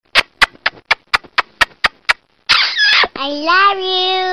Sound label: Kisses